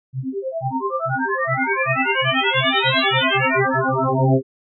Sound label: line 2 sine